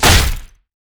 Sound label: basicattack dragon; LoL; shyvana